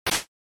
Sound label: SNES RPG Attack Whip